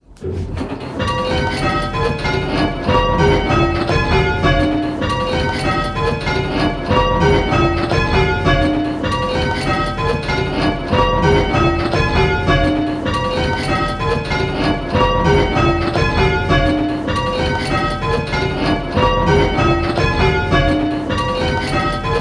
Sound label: Striking 6-Bell Call Changes; 135246 (Queen's); Pebworth